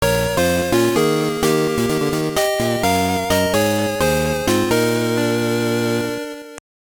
Game Over; Mega Man IV; GB

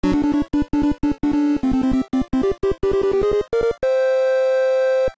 All Stage Clear; Mega Man 3; NES

